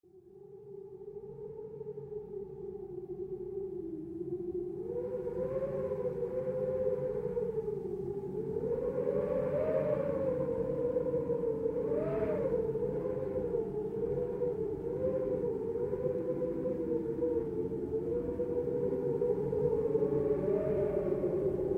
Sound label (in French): bruit du vent